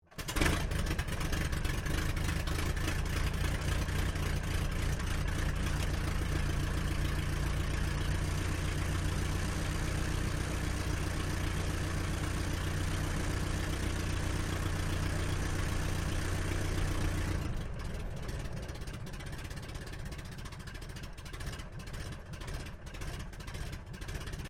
Aircraft Dehavilland Tigermoth Startup Idle Interior, RAAF Tigermoth biplane; RAAF Tigermoth biplane training aircraft